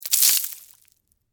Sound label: Texture, Debris, Spill, Sugar, Cone, Waffle; SoundEffects-BlastWave